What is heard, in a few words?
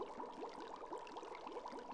reel Spin; netent